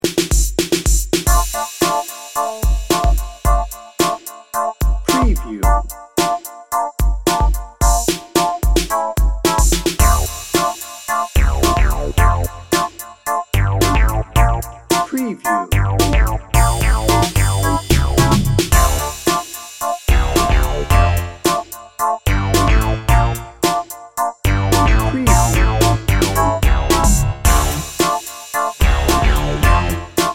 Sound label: bright and pulsing bed